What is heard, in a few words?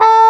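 CORN LIPS D4; AKAI S-Series CD-ROM Sound Library VOL-2; CORNET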